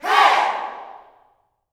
"Hey", Vocal Gospel yell shout; Spectrasonics; Vocal Planet Vol.1 Gospel; akai

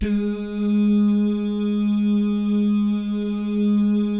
"DOO" GUYS, Vocal Jazz Fx; Spectrasonics; Vocal Planet Vol.3 Jazz Fx

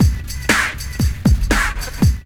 LOOP; E-Lab; X Static Goldmine 2

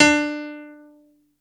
PNO D3

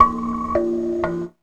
KALIMB

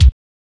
BD

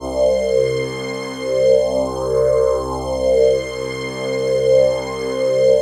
PHASE PAD, ATMO PADS; Best Service; XX Large

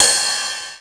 909 RIDE

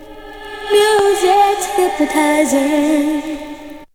VOC; ZERO-G; Datafile

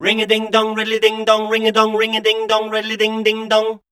scat, voice

